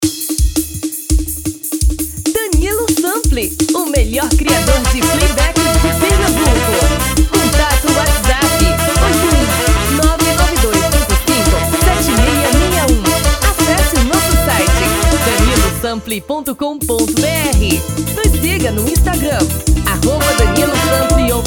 Frevo

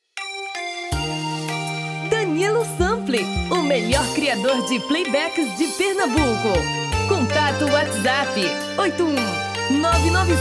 tom feminino

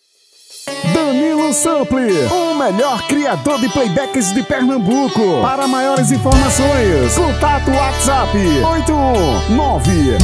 Forro das antigas

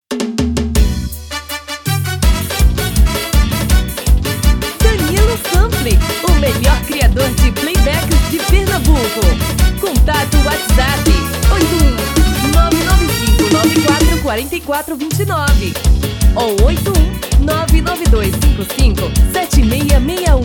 Axe

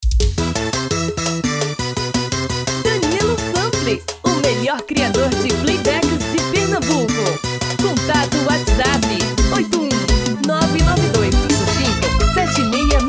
Tom masculino

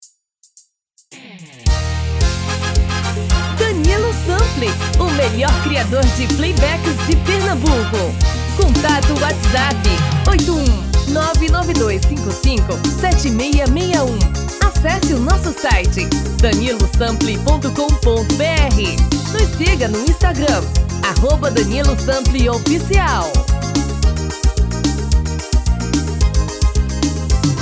POT POURRI Forro das antigas

